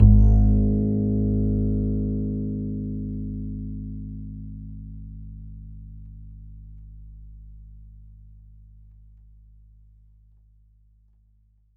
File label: E, E string upright bass; Larry Seyer